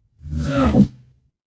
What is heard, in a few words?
transition riser